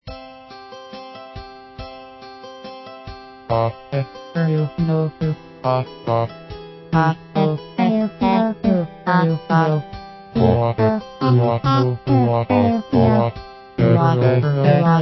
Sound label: kaeru vocal; かえるの歌